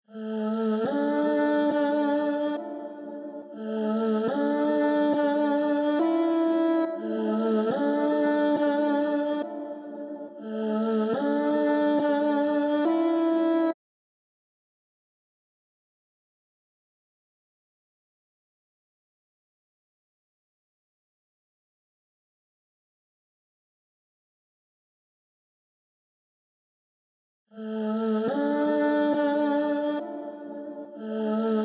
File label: 140 BPM D Min Vocals, Drill; Cymatics; 2022 Melody Collection - Drill; Empty The Clip